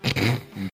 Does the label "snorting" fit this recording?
Yes